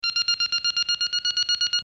lock on

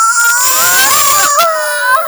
moan

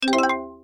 autodarts bonus cricket

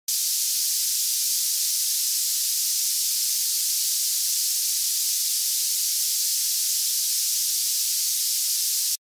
gas leak shhhhhhh

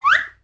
short zip up

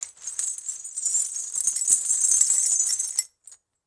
chain

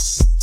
slice

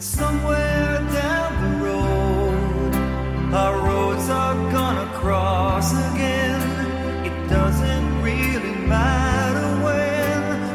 chorus

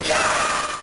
yaaaah

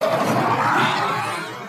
indoraptor roar

